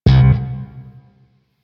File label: Shawzin Slap Oct Two C, Chords; Music; Warframe; Sentient Shawzin